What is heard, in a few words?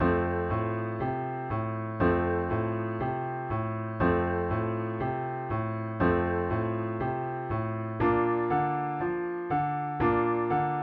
triad blues with chords F, piano